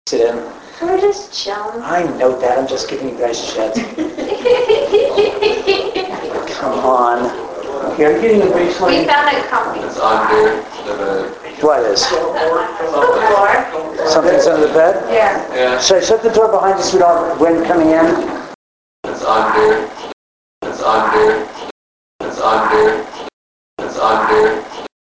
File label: EVP bottom floor